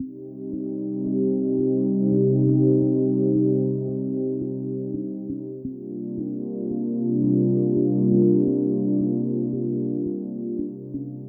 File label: Lush Pad Loop; Loops; BB 2HTC Samples Vol 4 Addendum